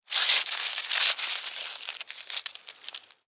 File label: Insect Move Wet, Insect, Movement, Wet, Bug; Blastwave; Animals:Insects